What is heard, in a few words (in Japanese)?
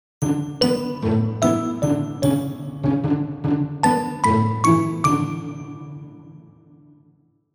サウンドロゴクラシック